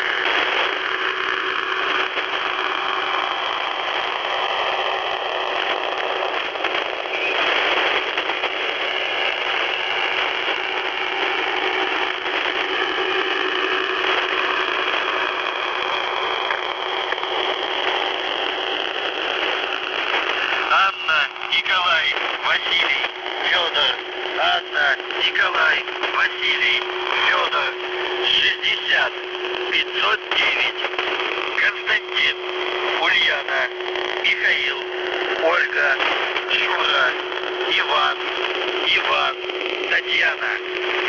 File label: The buzzer